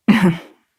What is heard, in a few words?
ahem; ningguang